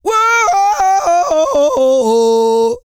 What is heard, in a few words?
REGGAE, Vocal Planet World Voices